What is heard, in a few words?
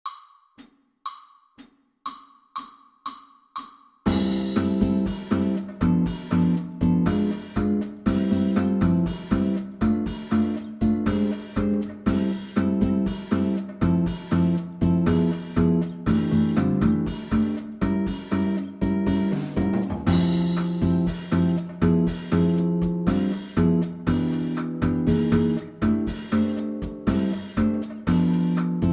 Bass PLAYALONG Jazz BOSSA; NMEA Jazz Etude